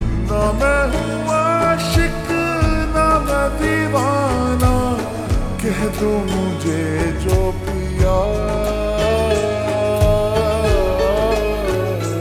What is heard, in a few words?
Ringtone Slowed Reverb